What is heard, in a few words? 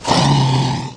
dancing dragon stand